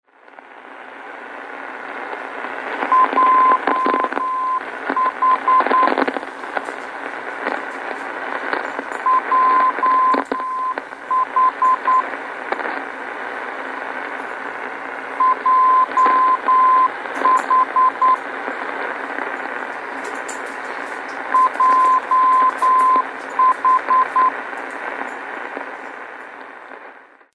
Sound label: Beacon